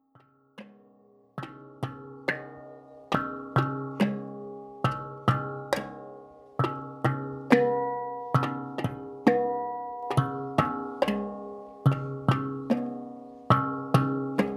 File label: Handpan